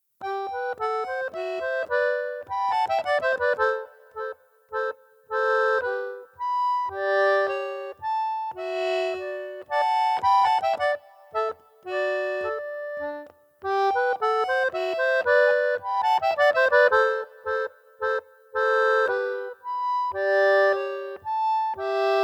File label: TA3000eco Klassik